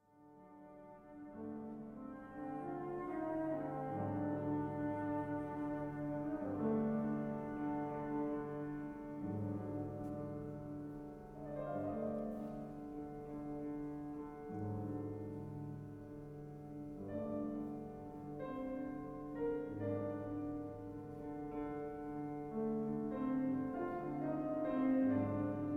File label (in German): Altfl u Pno